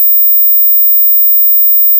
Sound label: Ultra noise